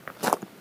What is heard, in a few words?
Stony Step, outdoor, Footsteps